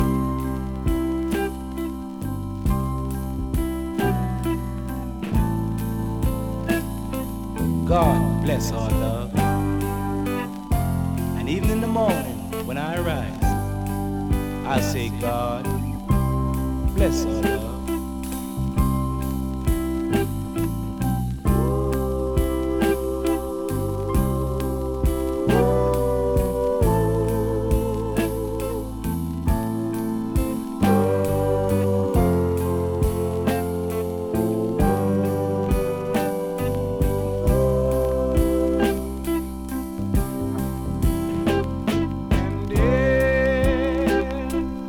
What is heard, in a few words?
Jamaica Deep Soul; FORM